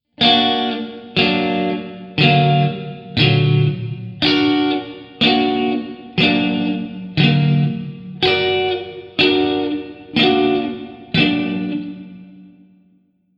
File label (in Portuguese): Montagem de Acordes Triade Aumentada